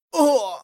Death

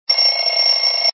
alert

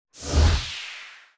clouds

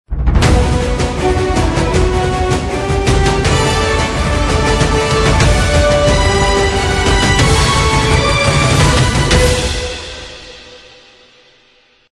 Bigwin music

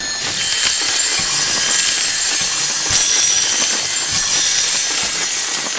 coinrain

